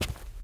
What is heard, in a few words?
run carpet